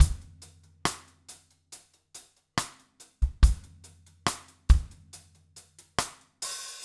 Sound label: Intro 70bpm Cinematic